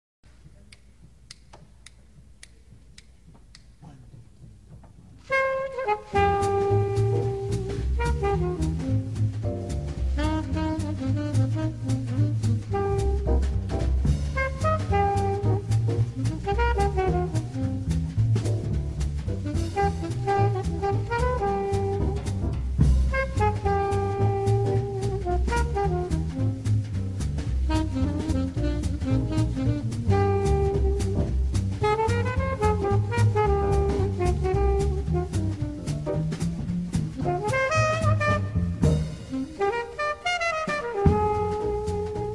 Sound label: quartet